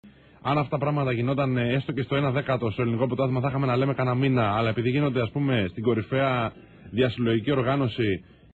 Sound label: organosi